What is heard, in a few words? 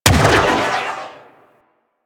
Railgun Biped Shot; Warframe; Venus; Venus Railgun Biped Agent